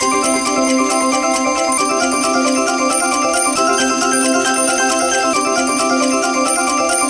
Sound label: count up; Amatic; Dragons Pearl